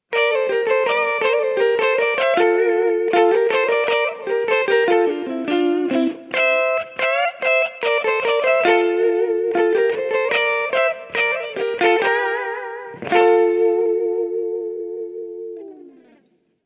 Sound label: Intelligent Harmony Mode 3rd Up Minor